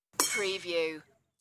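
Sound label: Baseball Hit Aluminum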